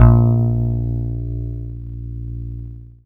PLUCKED, Synclav FM, A#; Big Fish Audio

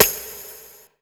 SD FX, Hip Hop R n B; USB Soundscan vol.19; AKAI

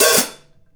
Hi Hat Open; Essential Percussion

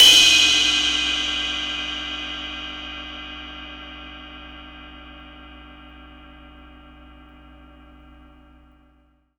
Cymbal Hit, Impact Drum Hits; Sonic Foundry (Sony Creative Software); The Electronic Music Manuscript Collection

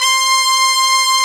BRASS, Brass Bones, Brs Layer; Club-50 - Foundations Roland

